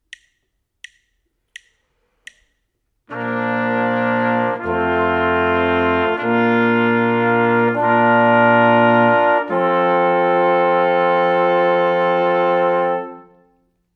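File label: harmonic dictation, brass quartet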